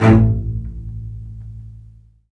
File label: Low Marcato; Roland L-CD702; Combos 1